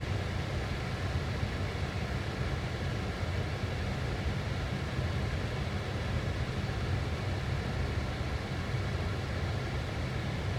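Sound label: Car Foley-Car Ambience AC Running Humm INTERIOR, Seat Ibiza; Seat Ibiza 2019; Systematic Sound; Mini Themes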